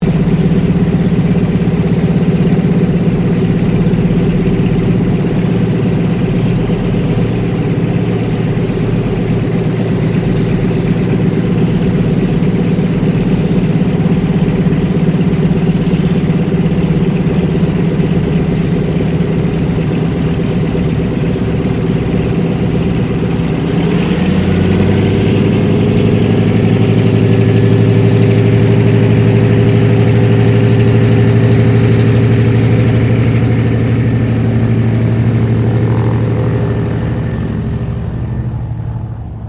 Aircraft Douglas DC3 Idle Taxiing, Douglas DC3 twin engine propeller plane; 1945 Douglas DC3 twin engine propeller plane